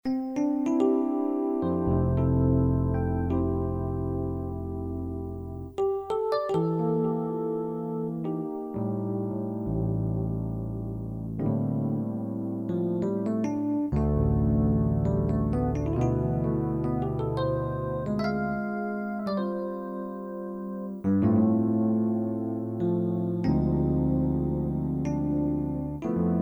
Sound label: No Sax No Lead Guitar Key Of F